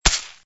wholepie only; Toontown Online

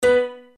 match piano; Toontown Online

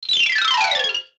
vine game fall; Toontown Online

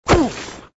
cannon hit dirt

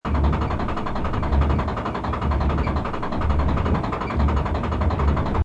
stomper raise

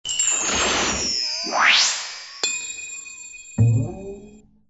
paradigm shift; Toontown Online

